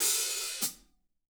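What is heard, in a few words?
hat open close, drum; 90s sample CD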